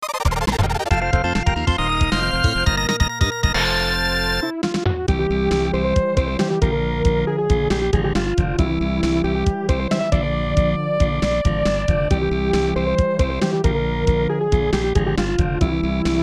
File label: Race Clear; battle racers; snes